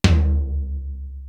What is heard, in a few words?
sloppy tom; East West; Global Sounds